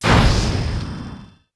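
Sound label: enemy explosion; Crash Bandicoot The Wrath Of Cortex; XBOX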